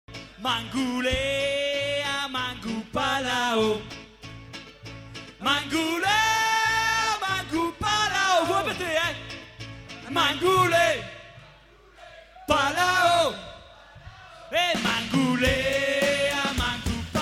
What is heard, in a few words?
Folk; Mangoule Vache; carnaval de la Grosse Biesse